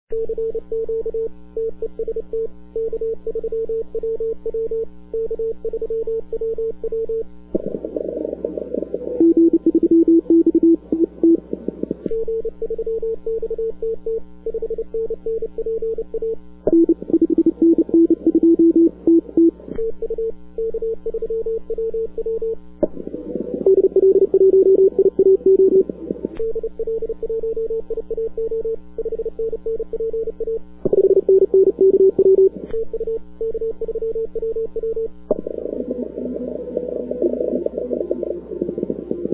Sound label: cw